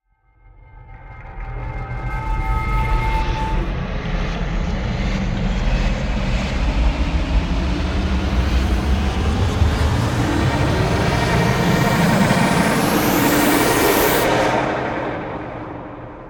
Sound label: Stinger, Swell, Reverse, Long, Organic, Rise; Trailer; Blind Drive